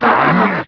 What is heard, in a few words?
alert; unreal